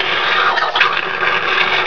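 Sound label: suction pump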